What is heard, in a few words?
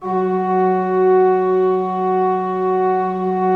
16-8 F3, Cathedral Organ; Propeller Island